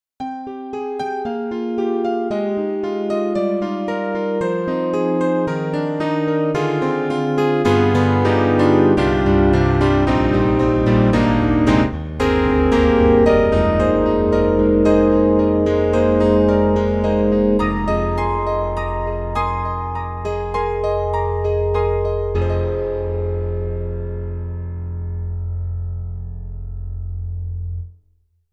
KORG KRONOS 2 Piano and Technology

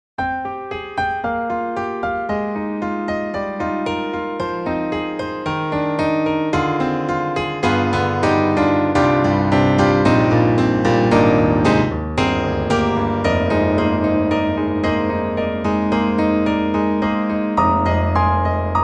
Truepianos Amber Default; 4Front

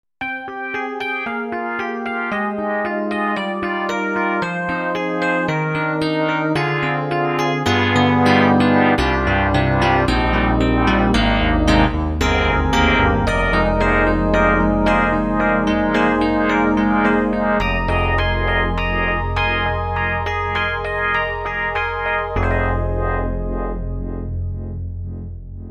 EDIROL SD-90 FM E.Piano EP Phase; Contemporary; EP Phase 2

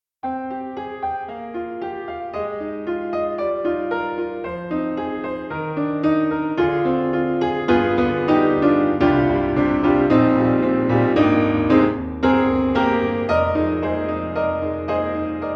Steinway B Full Mic; Orchestral Tools; The Orchestral Grands

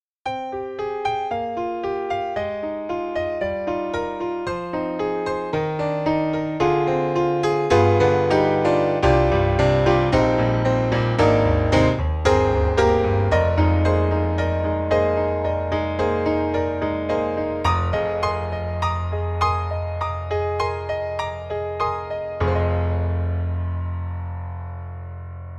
Mini Grand, Compressed + Cutting; AIR Music Technology

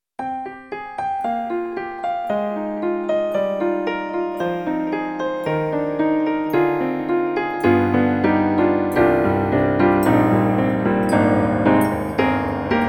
Ambient Piano; Roland; SonicCell

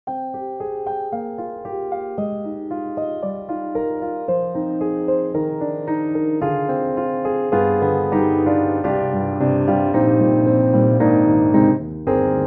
Dusty Bar Piano; Steinberg HALion 7; Verve

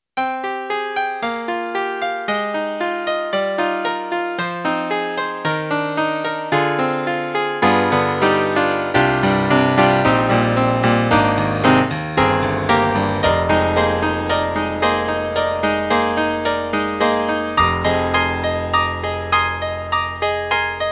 E-MU Proteus1, Bright Piano; PopRock

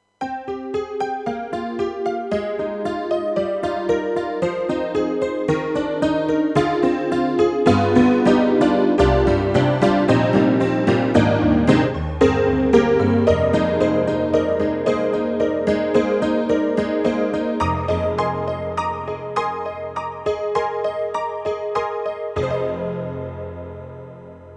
YAMAHA MU500 Hnky Tnk

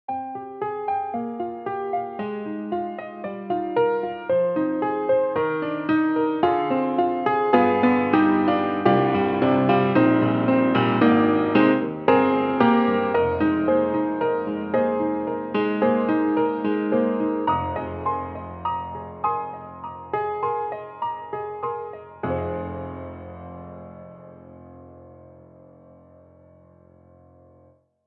Home Tape Piano; IK Multimedia

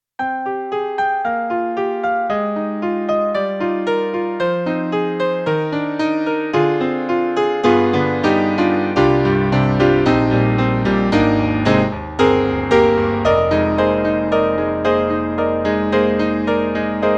Roland SC-88ST Pro Piano 1

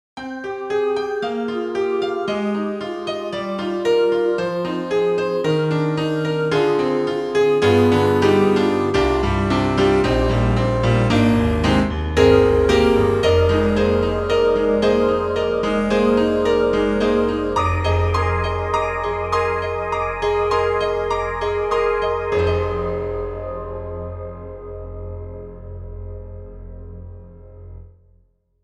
Piano And Voices

